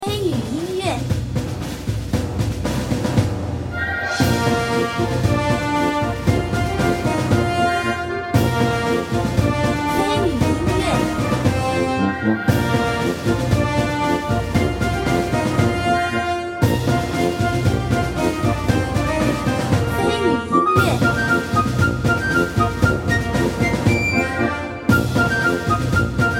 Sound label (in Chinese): 轻快-激昂-荣耀